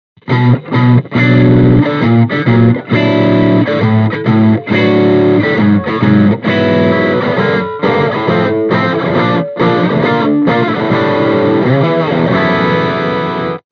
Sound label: Overdrive, Les Paul; Mini Rox Mic Inside; THE GOVERNOR